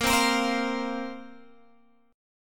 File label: Bb Suspended 2nd Bb down Guitar Open G